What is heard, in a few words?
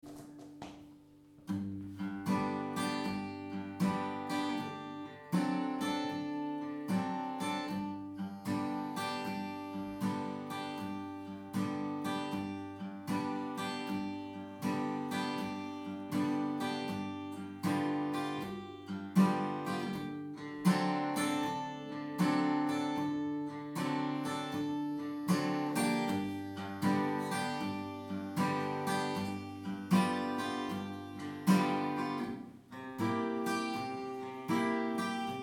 careless love jam